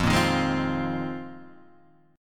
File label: Bb 7th Suspended 2nd Suspended 4th F